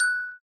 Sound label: bell, note; Minecraft; block